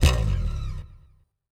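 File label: deep house FX, 125 bpm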